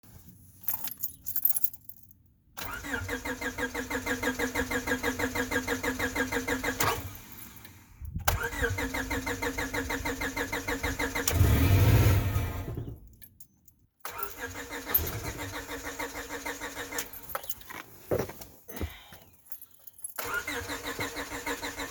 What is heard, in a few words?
98 accord cold start issues, 98 accord no start